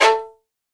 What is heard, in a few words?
SOLO VIOLIN; AKAI S-Series CD-ROM Sound Library VOL-7